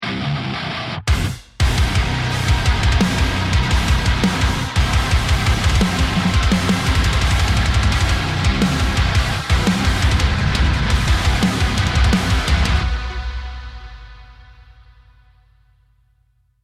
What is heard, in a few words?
start action, music